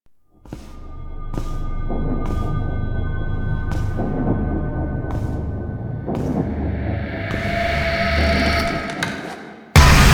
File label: Void Twin Jumpscare; Warframe; NineteenNinetyNine; Stage3RideOrDie